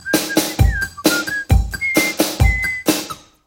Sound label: Dadada whistle